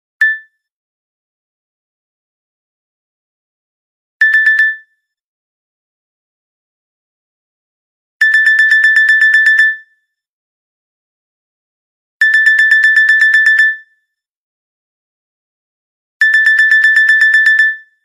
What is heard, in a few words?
Clock Alert